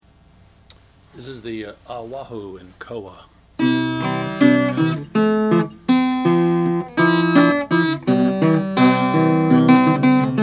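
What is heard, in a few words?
oscar schmidt oahu koa natural, Guitars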